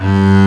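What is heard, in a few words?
double bass G2; AKAI S-Series CD-ROM Sound Library VOL-8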